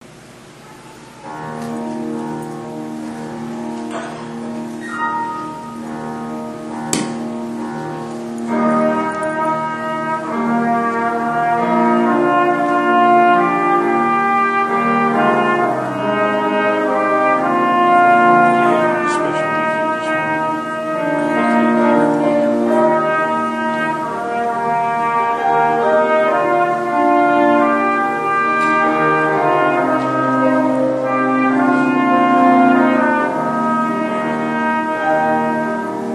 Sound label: Trumpet